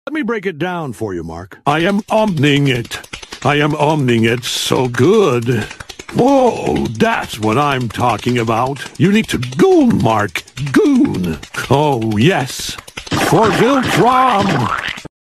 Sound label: hmm